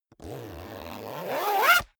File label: zipper down jacket close accelerate